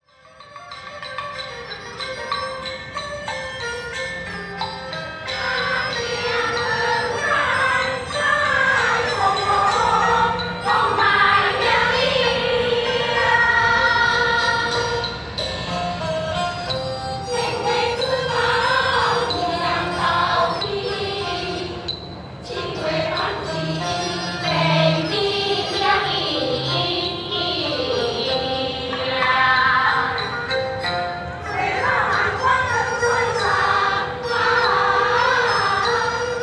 Cantonese Traditional Music Outdoor Live, Singapore; Les Cartes Postales Sonores; NOISE ON EARTH Vol4